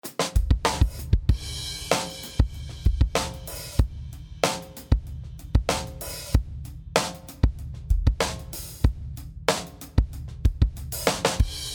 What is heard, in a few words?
90s Hip Hop; One Kit; fxpansion; BFD3